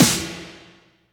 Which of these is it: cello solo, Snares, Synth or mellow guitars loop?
Snares